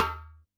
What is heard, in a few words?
DJEMBE, Yamaha DD-65